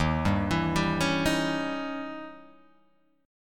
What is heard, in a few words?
Eb 7th Flat 9th, Eb, Guitar, Open G